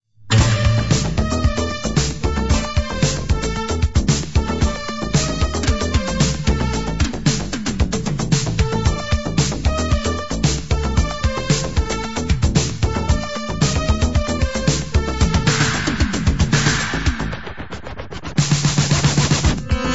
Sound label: Sequential groove